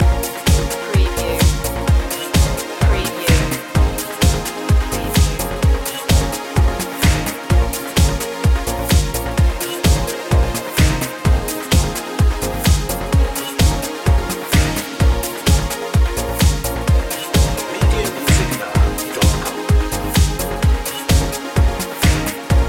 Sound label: london loop